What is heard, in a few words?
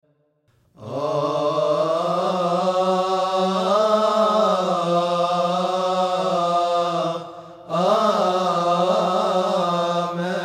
Amen x 3; Institution Narrative Precious Blood